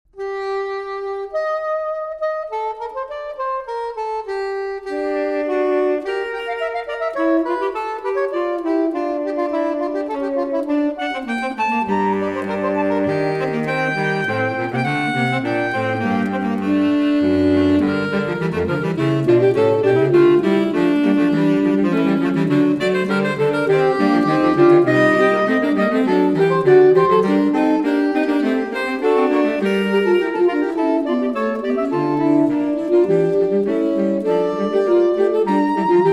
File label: sonata in g minor, classical